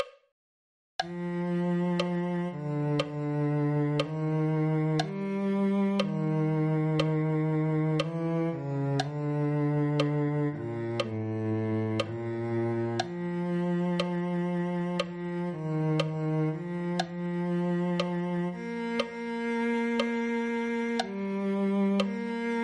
cello

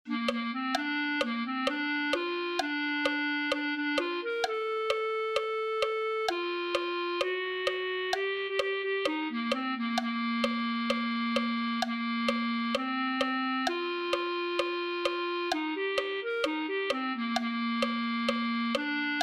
clarinet

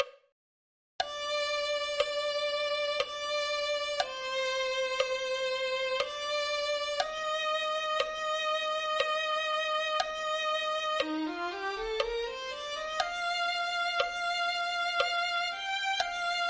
violin

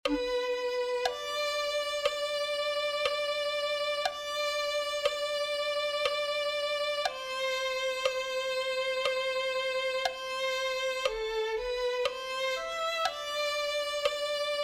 violin

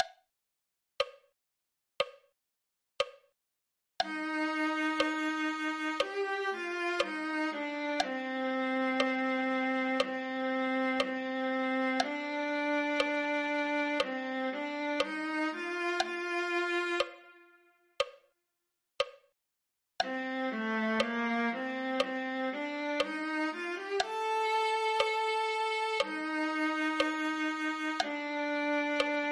viola

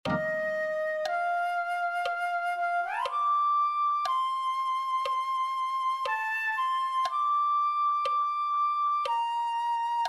flute

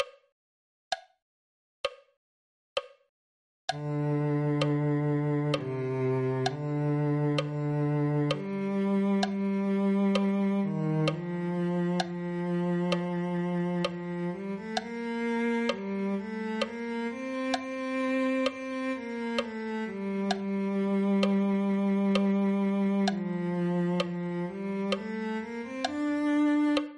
cello

